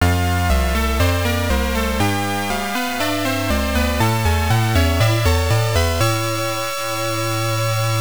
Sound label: Return in Triumph (Stage Clear); R-Type; TurboGrafx-16